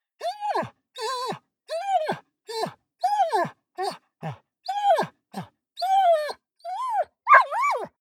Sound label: dog whine heavy; natur-tiere; hunde